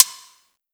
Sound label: hat